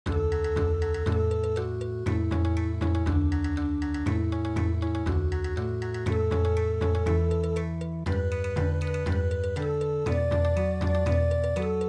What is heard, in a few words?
Bon Odori